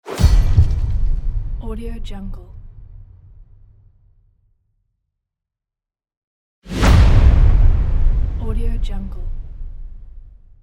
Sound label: epic cinematic effects; transitions and movement